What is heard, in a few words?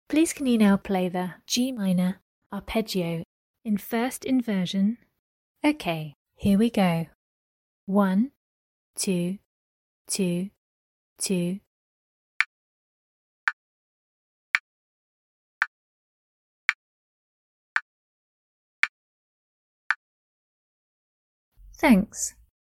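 G minor arpeggio first inversion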